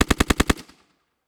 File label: Weapon Sub Machine Gun World War II Historical Long Burst; AdobeCC